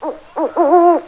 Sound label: OWL